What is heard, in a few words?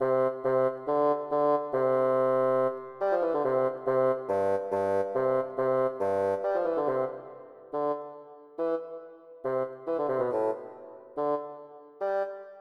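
bassoon; Roland - An Invitation To The Roland World Of Music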